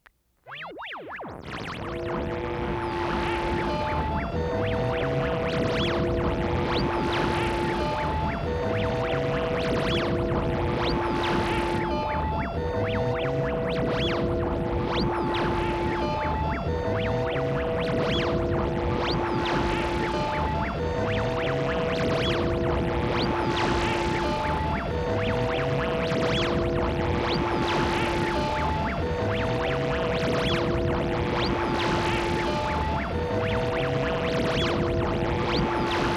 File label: instrumental